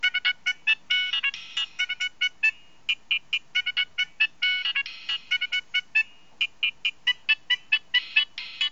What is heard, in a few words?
nokia3510i oompah ringtone